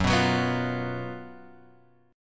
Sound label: F sharp Major 7th Suspended 4th, guitar; chordshape; down